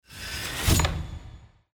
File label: open gap